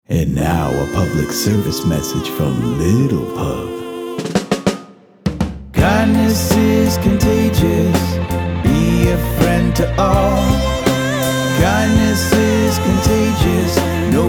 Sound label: jingle; Little Pub